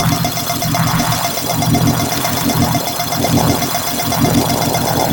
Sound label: Shield Burst Charge Loop; Warframe; Infested Lich; Hard Drive